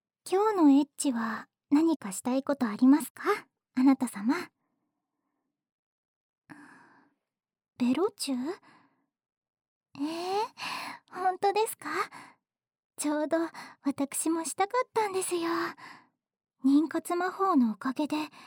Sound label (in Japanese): ずーっとベロチューしますね♪